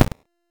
Short Sword Medium Parry Impact; Player; Combat

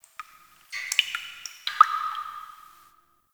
Drip, Ambience; Warframe; The New War; Zariman

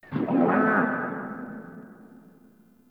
Distant Creature Elephant; Warframe; Eidolon Plains; Ambience

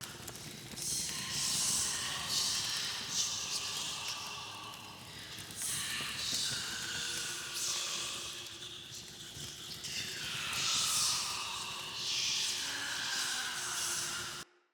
Ghost Loop; Fenn; Friendly; Jotar

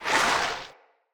Corrupted Battle Dog Skid; Foley

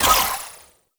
Daikyu Bow Prime Layer; Tenno

